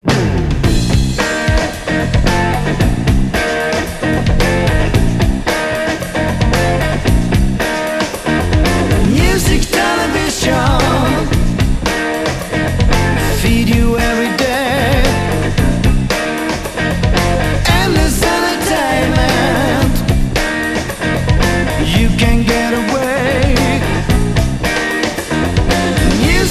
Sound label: ELECTRIC BLUES POWER